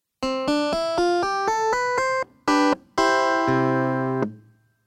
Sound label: Clavinet E6 Duo